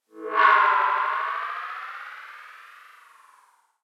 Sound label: fx